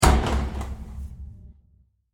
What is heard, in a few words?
Bursting Plastic Impact With A Deep Atmosphere; Bursts; Crafted Signals